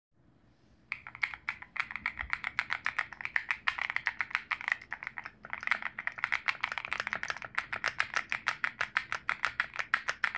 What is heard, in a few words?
kiiboom phantom 98